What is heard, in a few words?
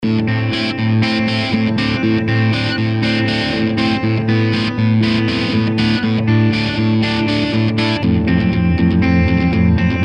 waltz of strings